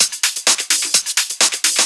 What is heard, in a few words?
Discohouse Loop 128 BPM; Best Service; House Definition VOL-1